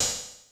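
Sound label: Electronic Hi-Hat Open, Yamaha DD-35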